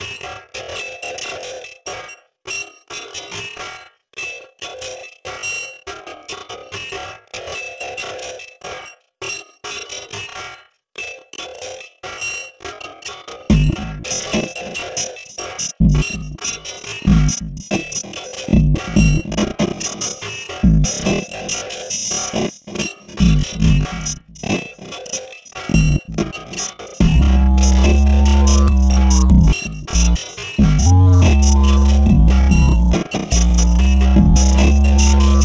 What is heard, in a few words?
junk dub